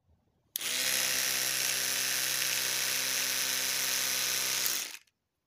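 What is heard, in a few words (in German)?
Elektrische Zahnbürste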